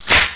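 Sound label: scorpion attack; gearbox; lg scorp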